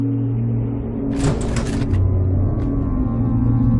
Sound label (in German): schalter; We Were Here